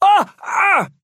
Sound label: pain big; Trapper